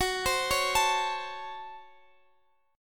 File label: Db Major 7th Suspended 4th Sharp 5th Gb